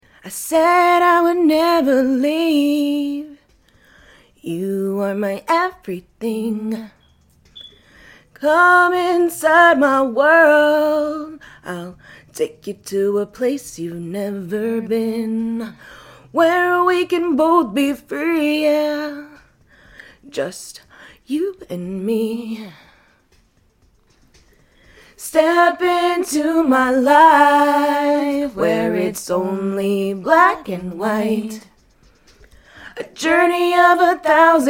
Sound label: pop 122 Dm